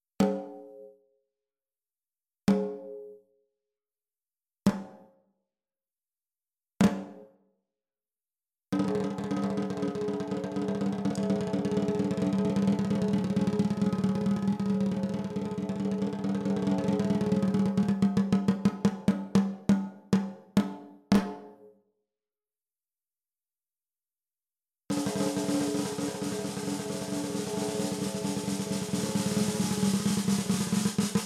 PEARL BRASS 14X65, CAISSES CLAIRES

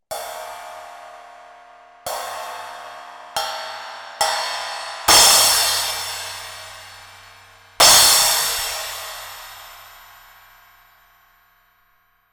ZXT TRASHFORMER 14, CYMBALES; ZILDJIAN

